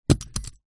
padlock metal med heavy drop carpet

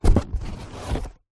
foley pizza box open; zapsplat

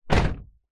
vehicles truck door close internal

